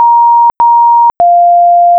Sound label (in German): Anruf Time Out Keiner Da